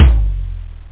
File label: real tom; toms